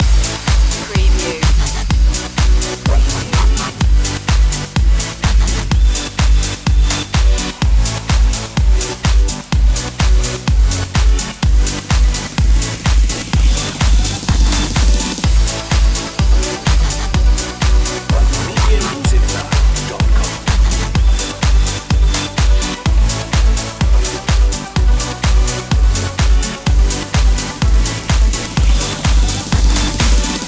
rhythm changer loop

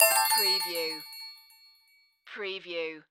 Multimedia Chime

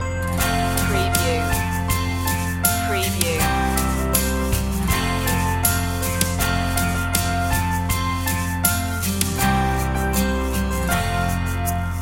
the wheels on the bus loop; children's music

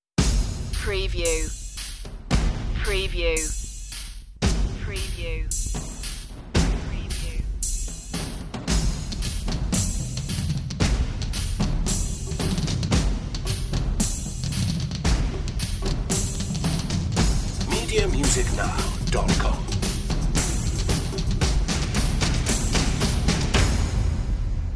percussion

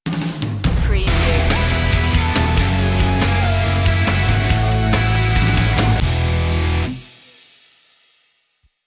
thunderbolt sting

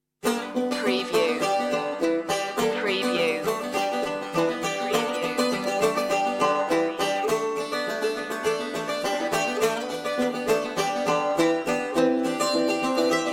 strings

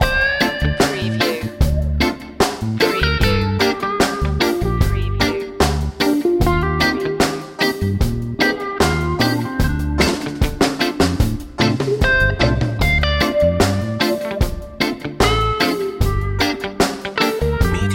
summertime reggae loop

